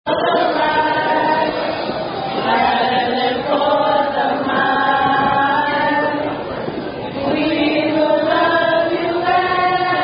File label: Zeta Phi Beta Song At Mullen Wedding [Sorority Song]